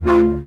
Synth Shots; Vengeance Samples

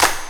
Clap; Vengeance Samples

